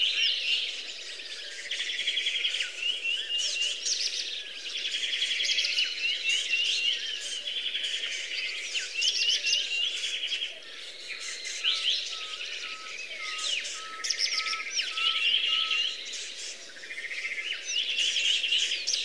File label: JUNGLE; Circuit SPLENDEUR MAYA